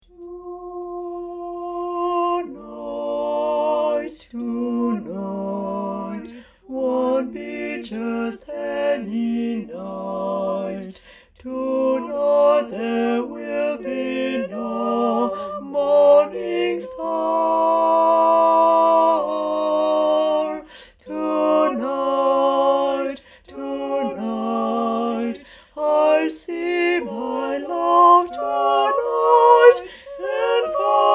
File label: tonight alto; version chantée